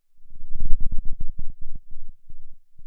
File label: pop, Sound of a thunderstorm